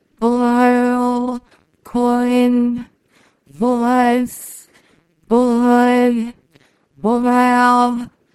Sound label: singing